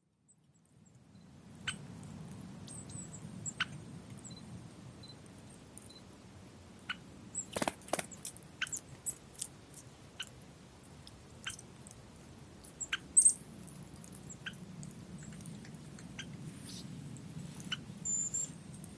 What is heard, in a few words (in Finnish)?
valko, linnut